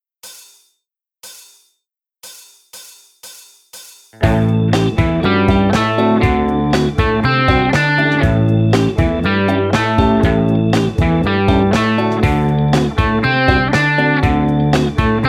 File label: Riff, Easy Guitar Riffs